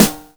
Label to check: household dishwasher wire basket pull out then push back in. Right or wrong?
Wrong